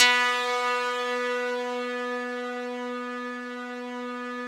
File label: RESO METAL 2 B3, Yamaha VP-1; Music Store